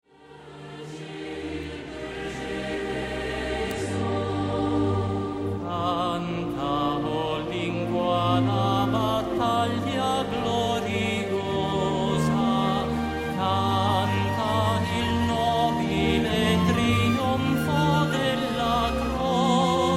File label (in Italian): Solista; 206 O Croce fedele